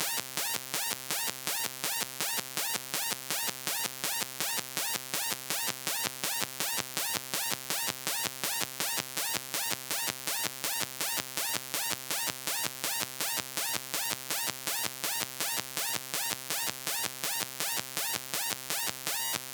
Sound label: mirror music